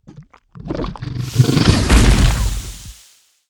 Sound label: seed frog; monster